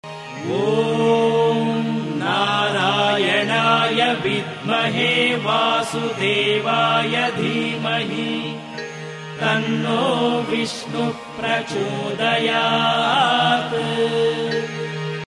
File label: vishnu (narayana) gayatri male